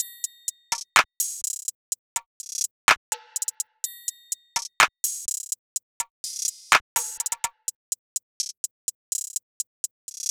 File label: Lament Hihat Loop - 125 BPM; Cymatics; Fiji Hihat Loops & Percussion